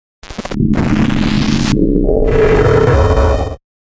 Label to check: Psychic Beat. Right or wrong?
Wrong